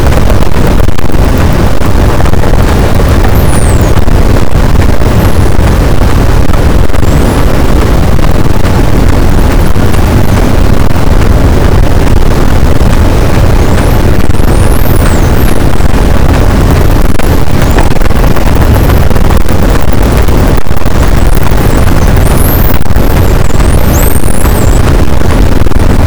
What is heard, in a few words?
cachalot, boat; Physeter Macrocephalus; SNR 6dB